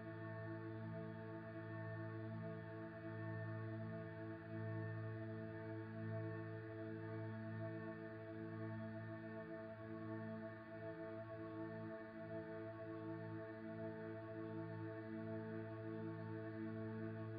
submenu loop

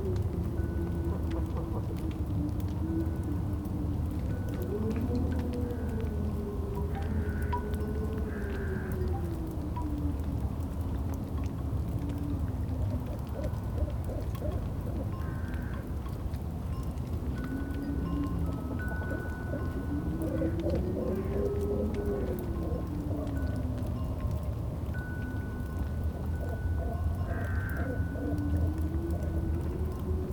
ambient hotspot

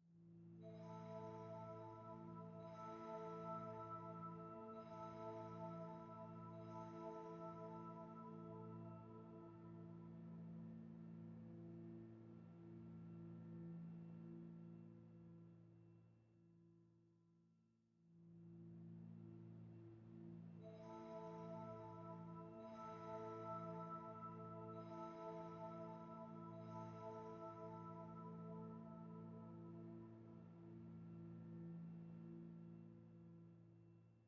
map loop

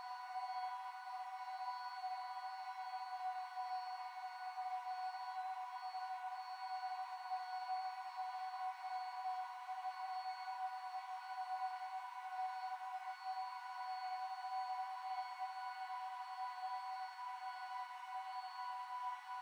monolith loop

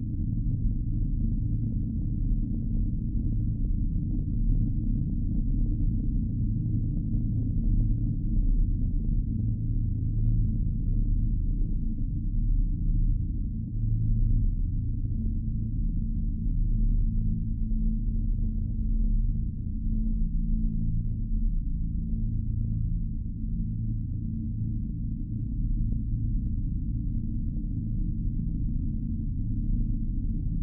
map loop

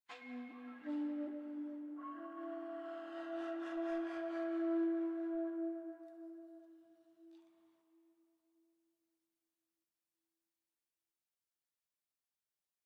music variation loop

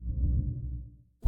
map black circle appear

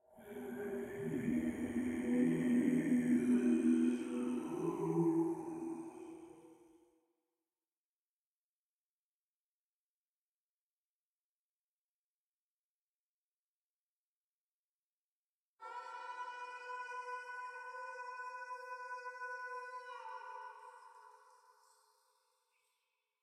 vocal loop

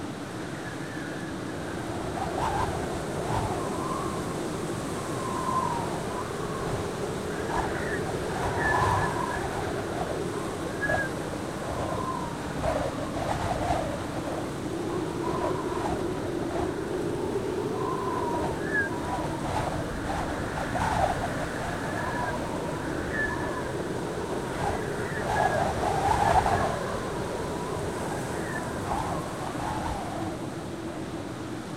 ambient monolith

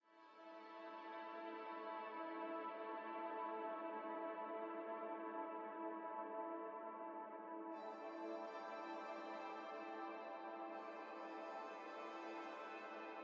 map loop

